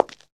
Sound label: Footsteps; Roland BK-5